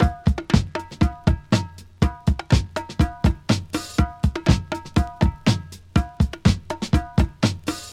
121 bpm breakbeat d sharp key, Free breakbeat sample - kick tuned to the D# note.